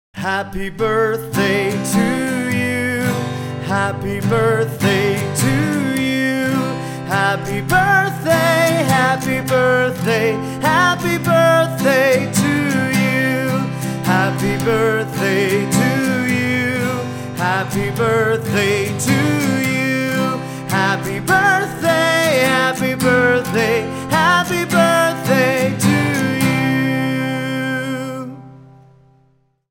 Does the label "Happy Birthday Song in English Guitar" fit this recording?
Yes